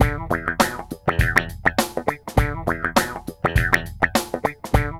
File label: LOOP